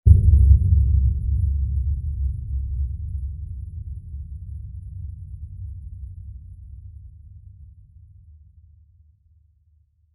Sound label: Low Impact With High Tinsel; Low Impacts; Sound Effects:Impacts; CraftedSignals